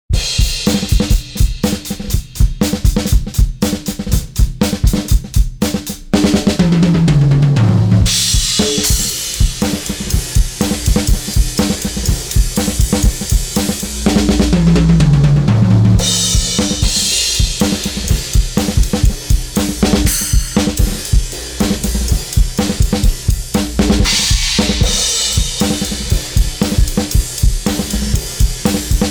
Lindell PEX-500 ANALOG off

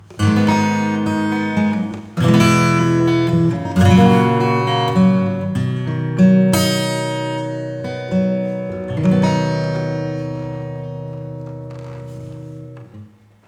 acoustic Robot Spring; XenoVerb

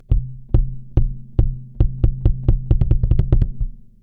kick; smart comp; FETpressor

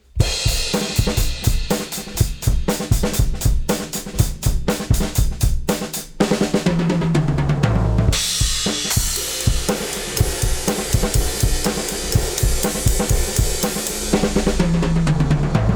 drums Punchy Drum Bus; Xtressor

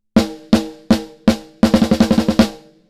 snare; smart EQ 3

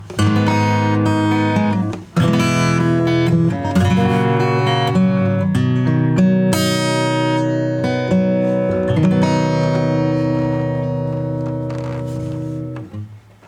acoustic; COMP FET-76

